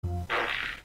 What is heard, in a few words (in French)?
pet